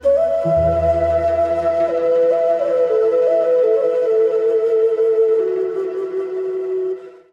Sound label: Xun